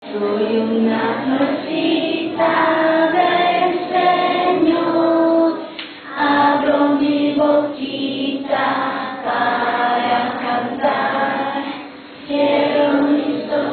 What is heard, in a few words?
Bolivia song